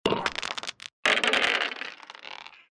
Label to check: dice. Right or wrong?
Right